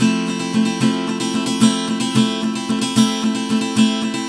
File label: Pop Ac-Guitar Strum Fmaj; 112; Pop Rock; Acoustic Guitar; Pro Tools Essential Loops; Digidesign